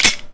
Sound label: slide release